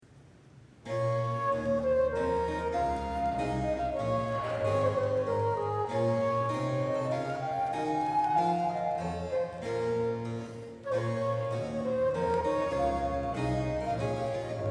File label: Sonata for flute in b minor, Allegro